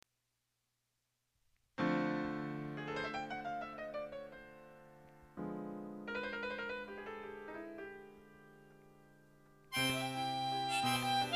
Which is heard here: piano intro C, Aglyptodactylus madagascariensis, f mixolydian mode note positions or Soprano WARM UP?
piano intro C